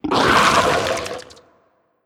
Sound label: Skel Scientist Vomit; Legend of Keepers (gamerip) (2019)